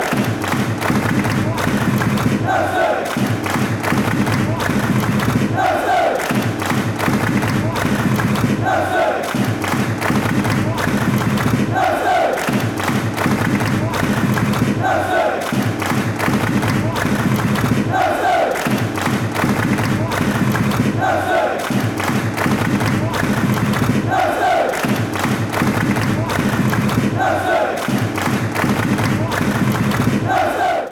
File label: claps, fan chants